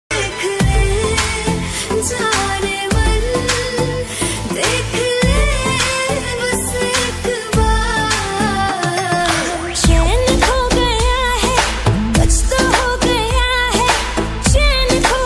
Hindi Love Song Ringtone; ringtones

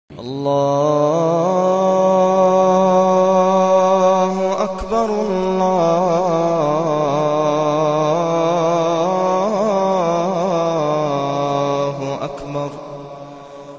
Top Islamic Ringtone; ringtones

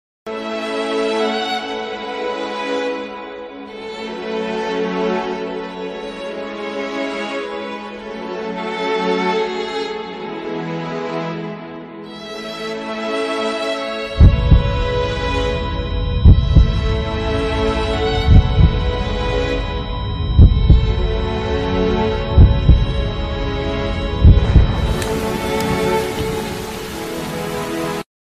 Telugu Love Failure Ringtone

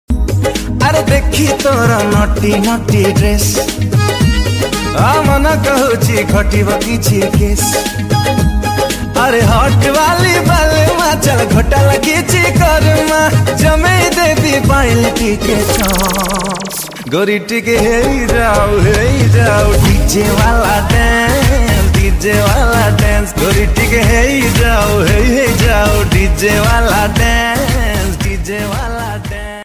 Odia Ringtone

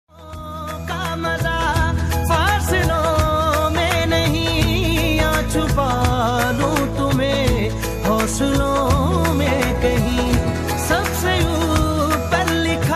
Romantic Song Ringtone

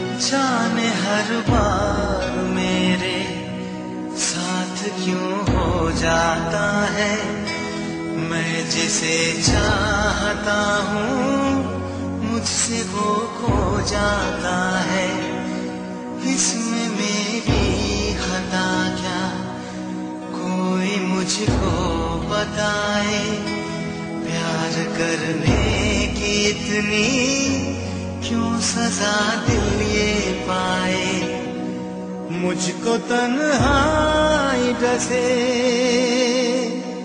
Sad Hindi Song Ringtone

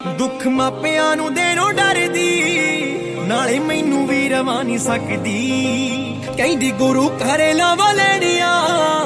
Punjabi Song

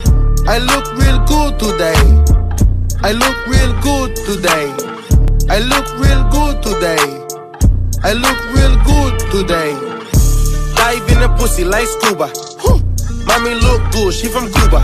iPhone 13 Commercial Ringtone; ringtones

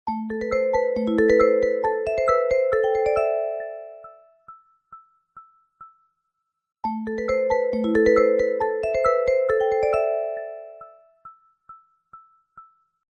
Instrumental Music Ringtone; OnePlus

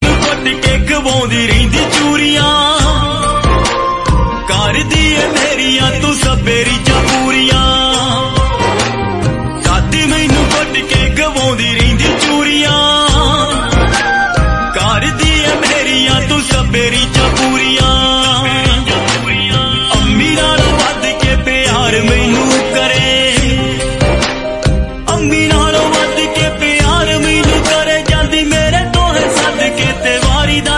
Punjabi Love Song Ringtone

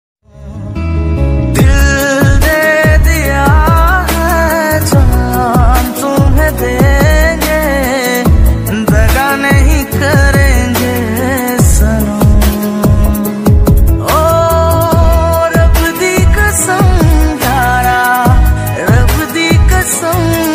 Heart Touching Ringtone

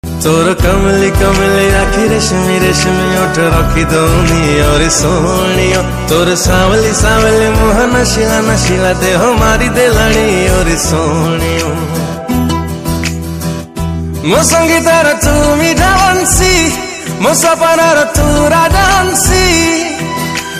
Odia Song Ringtone

